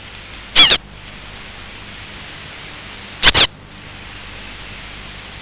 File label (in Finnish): keltav, lintuja